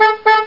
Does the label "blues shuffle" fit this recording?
No